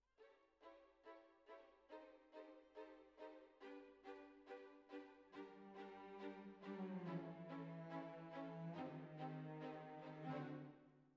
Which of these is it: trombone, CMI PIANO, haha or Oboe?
Oboe